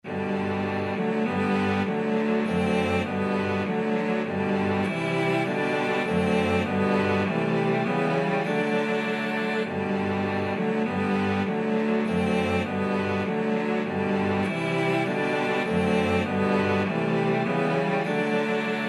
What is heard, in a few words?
cello quartet; german nat anth